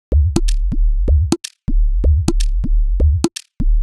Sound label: Top C 125bpm, House Drumloops